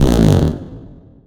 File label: BASS, 175 BPM, Drum & Bass; Ueberschall; Drum & Bass Flash Trackz